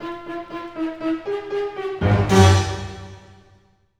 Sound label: Orchestral Loops